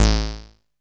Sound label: ACID BASS; AKAI S6000 CD-ROM - Volume 1; ACID LINE